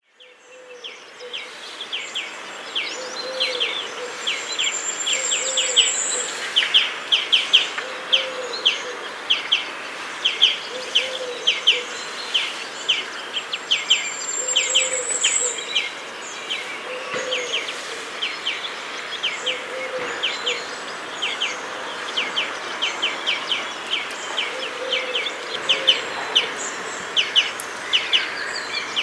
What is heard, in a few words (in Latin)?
Chloris chloris; SETE